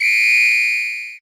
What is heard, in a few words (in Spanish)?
Pito; Best Service; Festa Latina VOL-1; Single-Hits